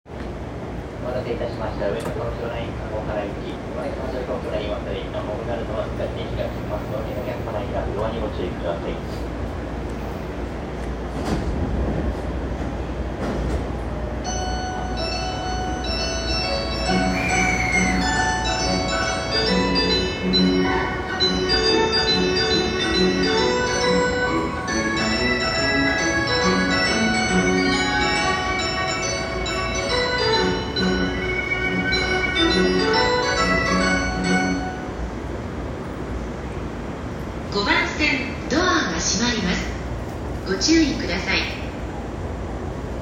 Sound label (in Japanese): JR東海道線小田原北, train sounds